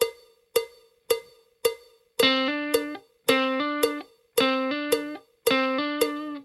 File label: blues tricks full, guitar